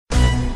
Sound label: mystery; Criss Cross 81; wazdan